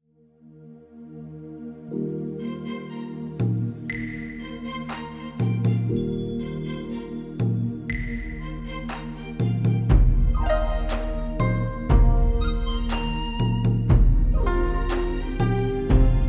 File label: Team Dance; Age of Wushu